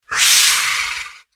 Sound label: biter roar medium; factorio; Factorio 0.14.21; creatures